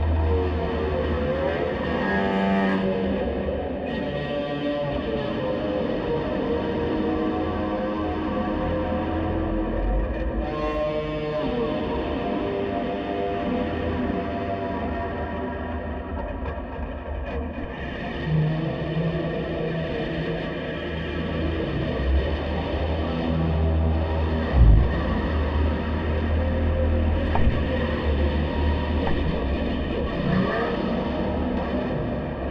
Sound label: Space guitar Wet